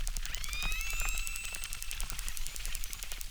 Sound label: Chirp, acquarium